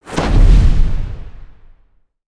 Stun Impact; creatures organic

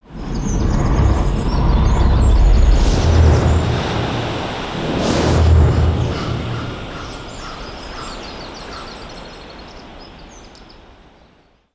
Pet Rock Binoc, ambience

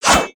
Swing; Little Friend

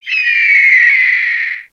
Howl, creatures pets

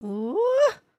breakdance woo rising, emotes

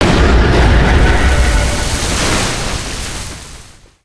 Siege Tower Impact; destructibles

